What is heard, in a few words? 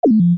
SCIFI